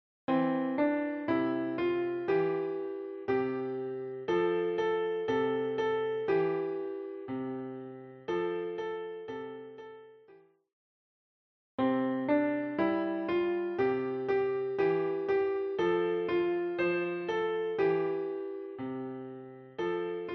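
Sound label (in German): Kinderlieder, Klavier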